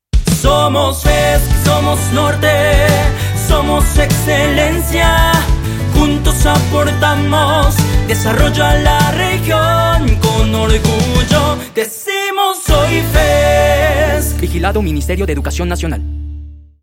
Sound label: Jingle; FESC